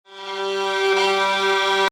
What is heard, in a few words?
Violin Drone; Multiple Violins creating a melancholy drone.; Strings; Sound Design